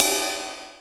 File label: Ride Cymbal